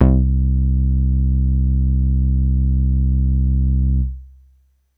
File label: snap bass C2